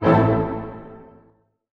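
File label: A minor pianissimo, orchestra hits; Sound On Sound - Peter Siedleczek's Orchestra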